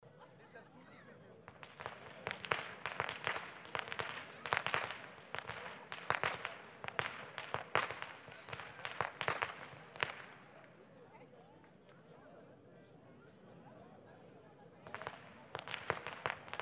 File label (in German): schnalzen, jugend; waging